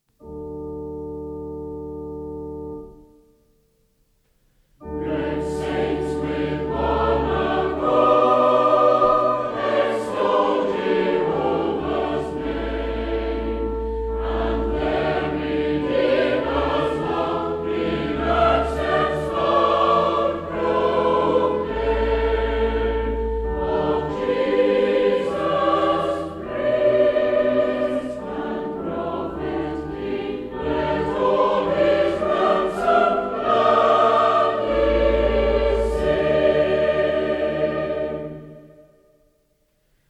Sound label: Choir, hymns